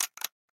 click

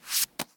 poker move

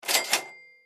reels stop spin

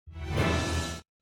Freespin End

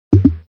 btn start

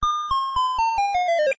slot begin

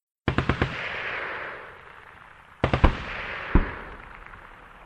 Far fire

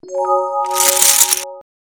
falling golds

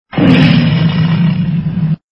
sound water

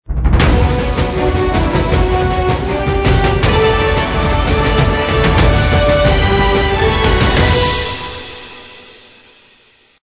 Bigwin music

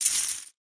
jetton fly to player

